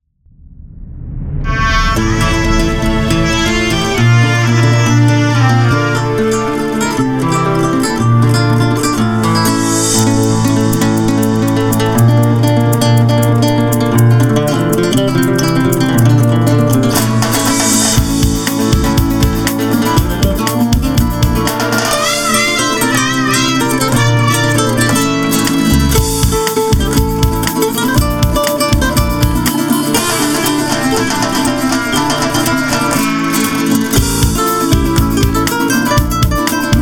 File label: Flamenco